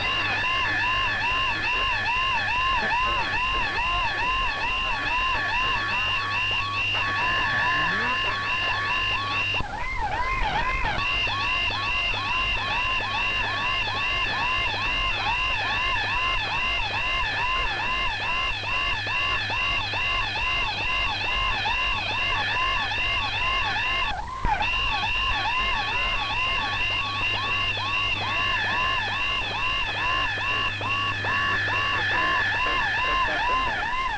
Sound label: Vietnamese siren jammer